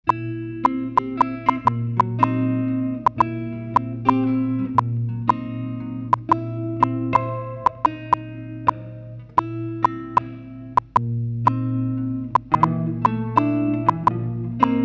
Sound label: guitar processed; pertusa; generated sounds Leveau